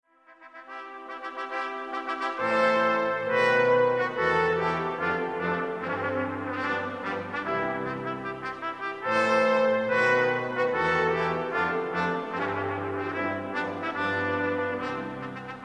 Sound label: wedding march, brass